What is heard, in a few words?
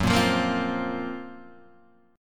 Gb Major Sharp 11th, Guitar Half Step Down